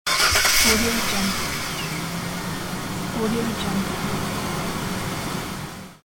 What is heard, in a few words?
car starting